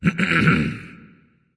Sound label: clear throat; smite; ahpuch